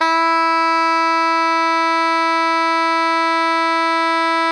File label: SOPRANO E 3, Saxophones; Greytsounds; Philip Wolfe David Crozier's Saxophones